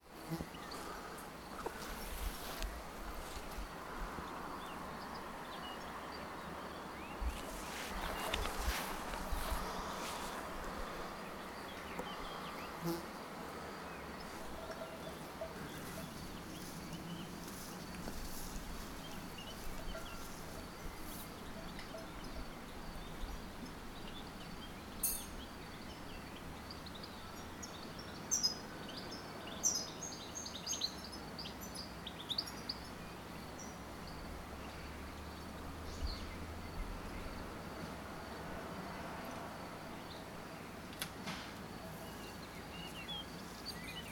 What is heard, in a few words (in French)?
Cloche